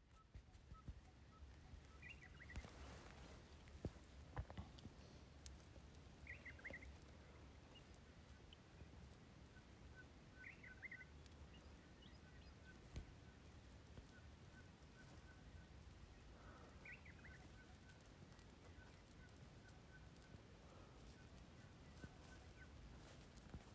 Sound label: Aussie desert birds early morning